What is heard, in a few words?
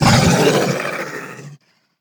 Monster Attack Finisher; Monster Lizzie